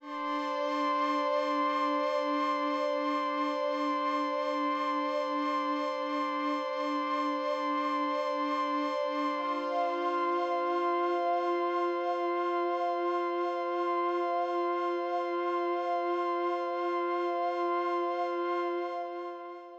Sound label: Pads